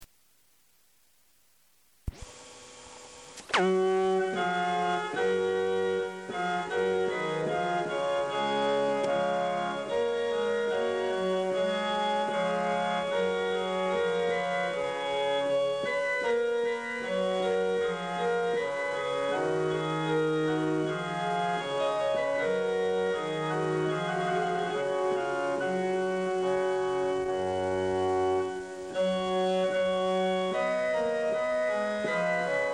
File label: march